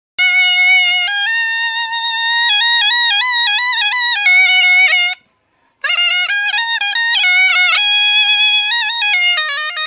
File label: saxophone ringtone